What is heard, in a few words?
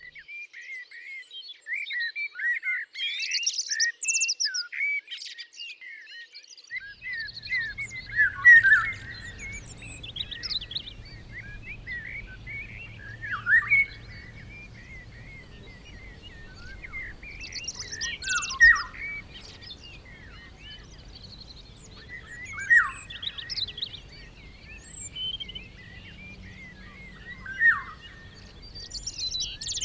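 oriole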